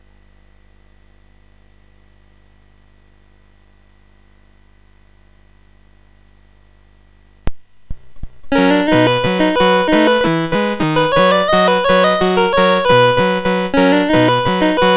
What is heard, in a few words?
sid MOS6581R4, GAMES; Cest la vie